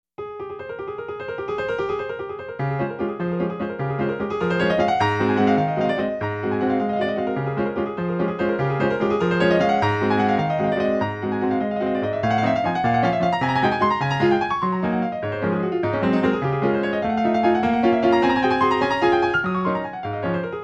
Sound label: classical